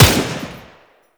Tommy Gun Shot; Warframe; Tenno

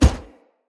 Heavy Grunt Boot Walk Gravel, Footsteps; Sinecure

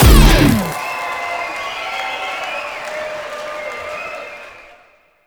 On Beat Solo; Warframe; Temple

